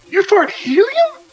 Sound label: Pig You Fart Helium